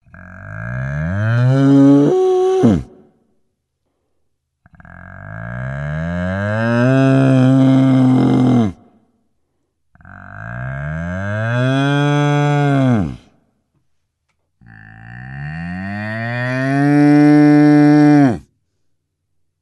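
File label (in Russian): звук мычания быка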